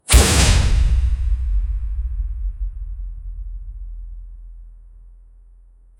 one shot heroic power, radiant

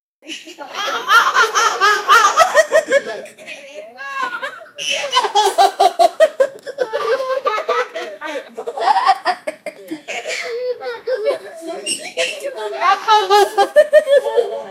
sounds of a group of, human behavior

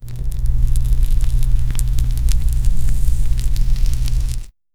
acid burning meat fastly, chemical

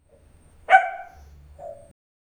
a puppy barking once only; night

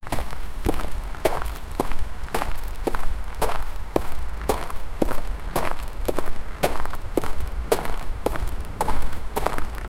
footsteps walking on the, road

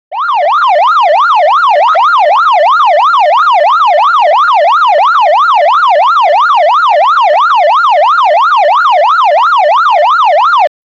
emergency

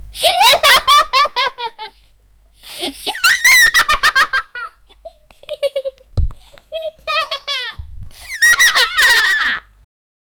soft kids laugh ha ha

